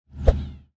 Close The Iphone; Dude Theft War; Android

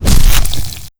critical hits

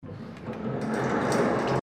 Accordion door opening; Robobeat

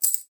Coins

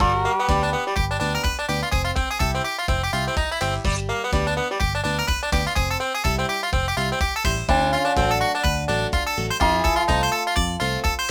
Music Western Intro

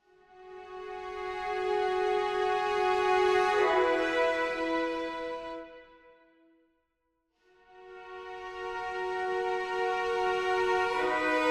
Training Orchestral; Ready or Not; Supporter OST

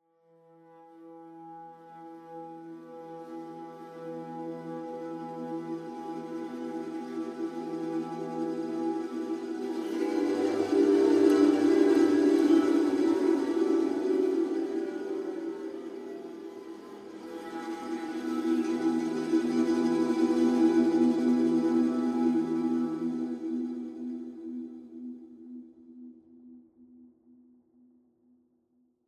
Theme Edgy Mood

